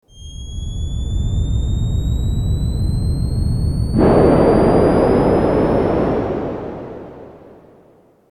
explosion; maoudamashii

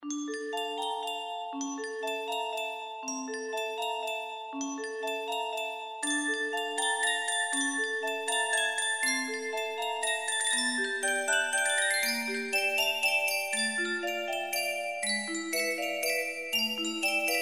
doll, MIDI; Fluid R3 GM; G2 MIDI

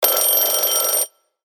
phone; maoudamashii

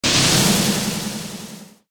battle; maoudamashii

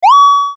retro; maoudamashii

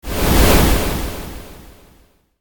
element fire; maoudamashii

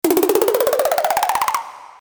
magical; maoudamashii

